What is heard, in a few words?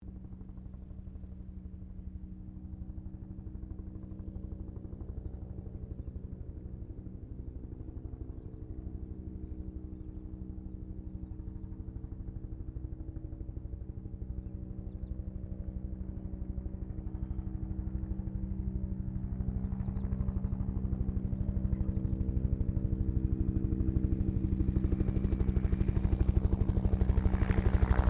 HELICOPTER 1967 BELL UH1 IROQUIOS PASS